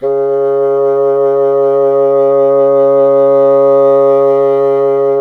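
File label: wind bassoon C0; Miroslav Vitous; Solo Instruments VOL-1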